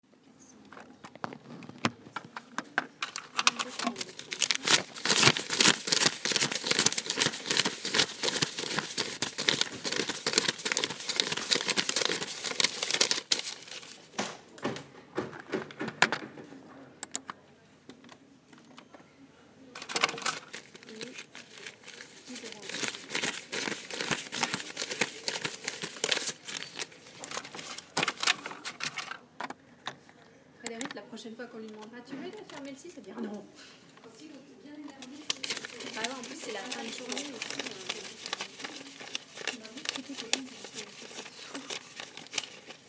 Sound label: sound of bank notes